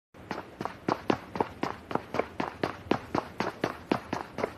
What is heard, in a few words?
Foot in pubg; پابجی